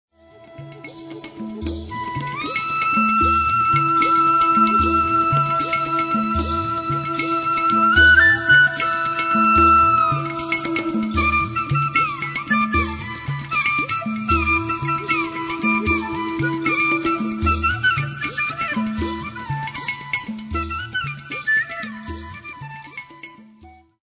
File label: raga